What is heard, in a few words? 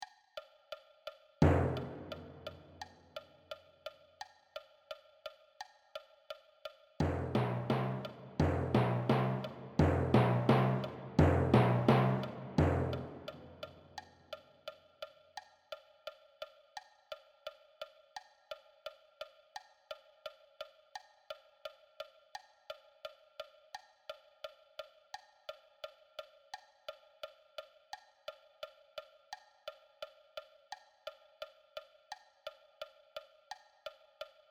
Timpani